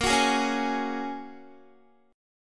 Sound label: Bb Minor 7th Sharp 5th, Guitar; Bbm7#5 strummed